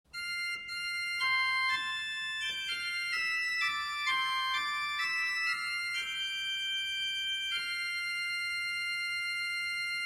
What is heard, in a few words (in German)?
RP Mixtur 3fach